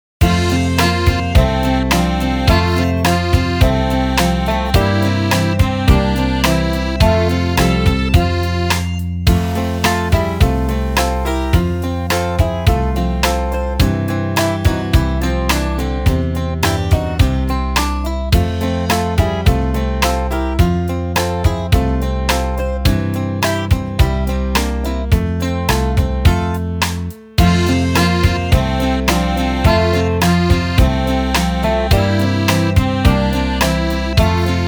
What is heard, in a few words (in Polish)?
Polska to jest instument